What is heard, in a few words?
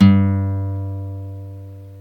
GTR Nylon, Roland; 90's Sample CDs; Club-50 - Foundations Roland; Nylon Martin; Nylon 1